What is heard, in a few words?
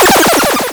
Lose, arcade